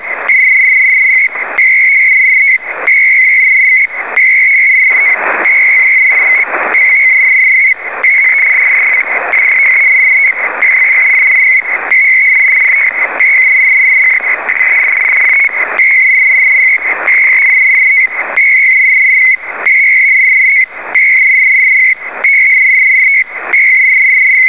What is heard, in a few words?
pactor